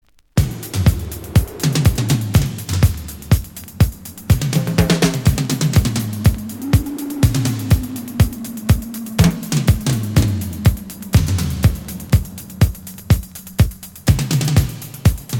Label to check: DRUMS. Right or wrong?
Right